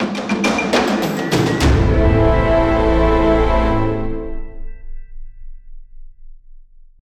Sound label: Music Stings; Hangar